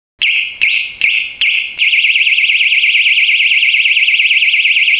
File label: viseguard wave